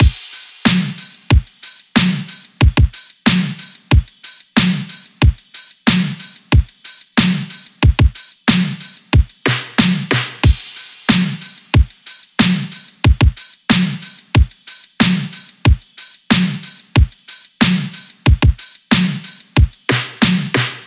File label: drums 92; Drum Loops; Full Drums; Hammer; Slate; MIDNIGHT Sample Pack